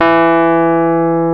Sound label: PNO, Rhodes Mk.V; Keyboards of The 60's and 70's